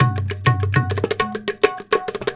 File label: India, Heart of Asia